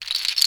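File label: HUSK SHAKER, CAMEROON; AKAI S6000 CD-ROM - WorldSounds; CAMEROON PRC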